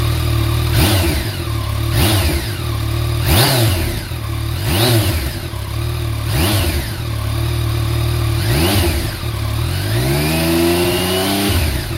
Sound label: Standard, Tracer Akrapovic